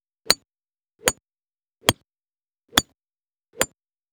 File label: golf club wood mono; soundscrate